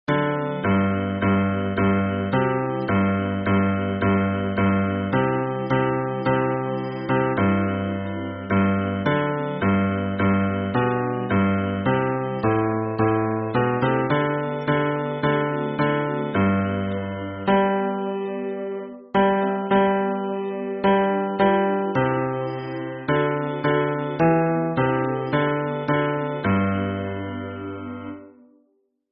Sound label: hymn bass